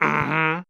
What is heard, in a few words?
mmm hmm, voice; ooh ahh